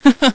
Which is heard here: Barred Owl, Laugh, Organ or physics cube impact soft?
Laugh